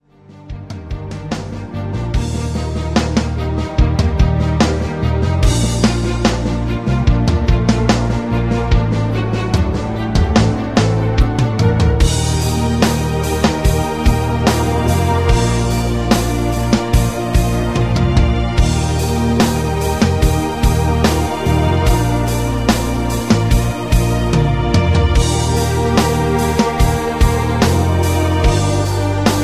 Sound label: rhythm